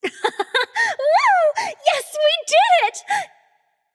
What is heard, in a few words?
yay light; smite; voicepack; hel